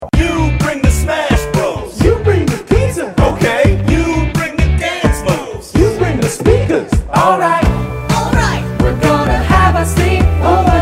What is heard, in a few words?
tone; Sleep Over Tonight; android